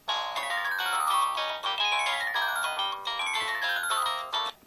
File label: samsung sghe700 ringtone